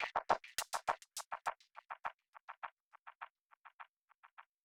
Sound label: music endloop percus; music ftue endloop percus 01